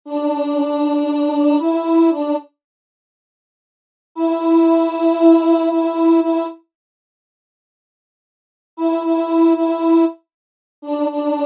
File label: Chorus Voice